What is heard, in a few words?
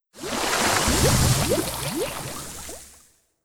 Oil; Legend of Keepers (gamerip) (2019)